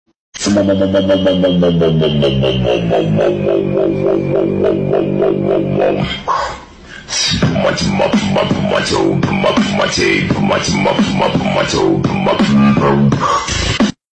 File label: beatbox